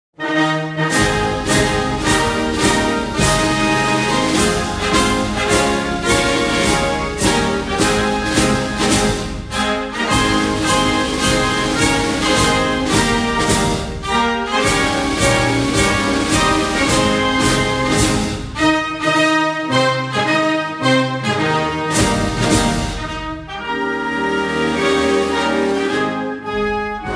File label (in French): La Marseillaise Instrumental